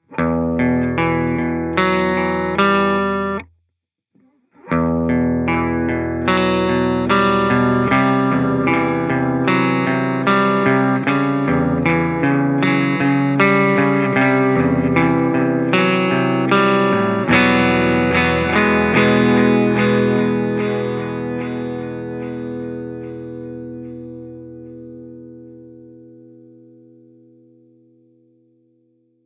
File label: Digital Dynamic Duck